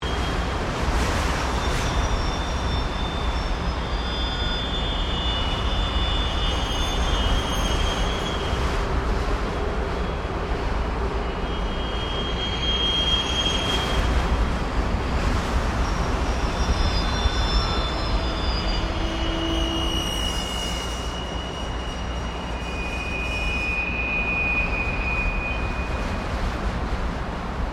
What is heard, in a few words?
Wind And Resonance In Apartment Building; Weather